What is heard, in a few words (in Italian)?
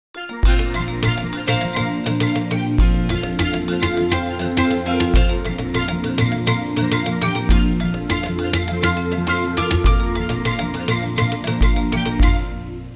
suonerie musica hawaiian